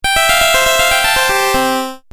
Game Music Phrase G, video game

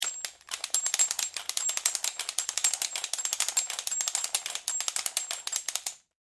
Industry Dual Ratchet Turn Clicks; Hand Tools

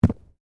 Bodymovement Ground Impact Heavy; Body movement foley to simulate falls and deaths; Wrestling And Struggling

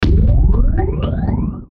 Hit Swirl Multiple Power Up; Blastwave

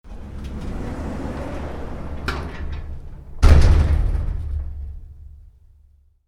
Garage door shut, Garage Door: Close Manually; Garage Door Sound Effects; Garage Doors (Sound Effects:Doors); Stuart Duffield

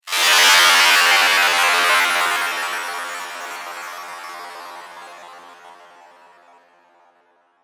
Digital, Sweeper, Scifi; 5.1

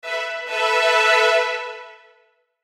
Hit Strings Stab; Hit Strings Stabs; Sound Design; Blastwave

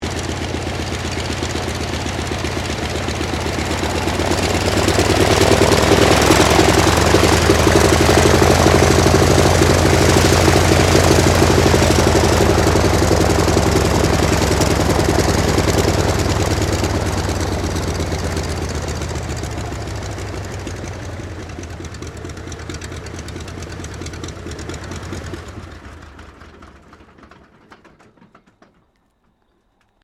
AIRCRAFT CAC WIRRAWAY SHUTDOWN; CA-16 Wirraway; Airplane Sound Effects; Airplanes (Sound Effects:Vehicles)

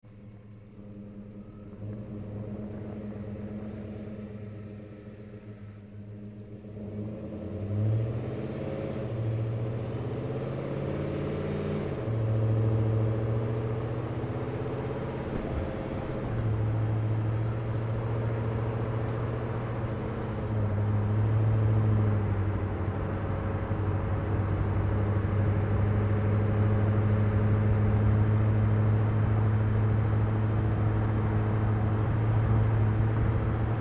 Truck GMC Savana Onboard Drive Accel Decel Fast; 2010 GMC Savana; Trucks

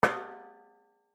Chair Metal Folding Chair Folded Hit Knock; Chairs; Foley; Blastwave

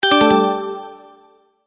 Multimedia Tone-Return/Back

